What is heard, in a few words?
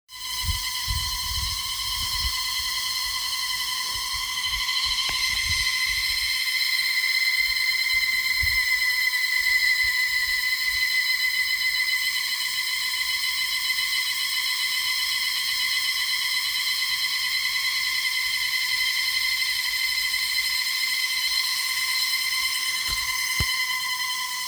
Sound of jungle